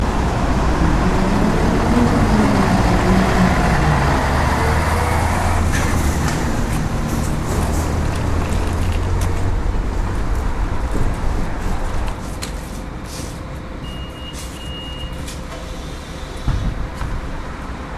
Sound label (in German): Verkehr